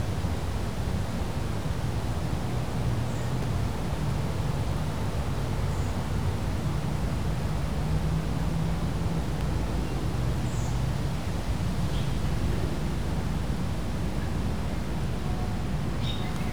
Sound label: Park quiet; ambient; Project Gotham Racing